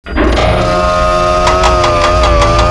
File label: crane cant lift; ambience